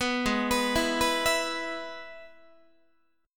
Ab Minor Sharp 5th chord, Guitar; arpeggiated; 14,16,14,14,15,15; 4A,4E,5A,5D,5Gsharp,6Csharp

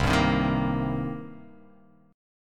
C Minor 7th C

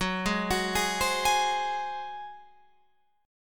F sharp Suspended 2nd Flat 5th, Guitar, Open F; arpeggiated; 18,15,18,15,15,15

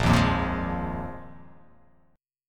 A sharp 7th Suspended 4th down

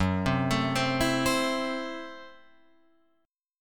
F sharp Minor 7th Flat 5th F sharp Guitar Open F; arpeggiated; JGuitar

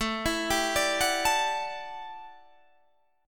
A 13th Suspended 4th A, guitar chord

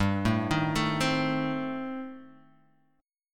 A Diminished, guitar; Adim/G arpeggiated; JGuitar

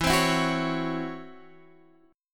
Db Minor Major 7th Double Flat 5th

